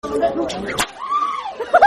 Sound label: gay detector sound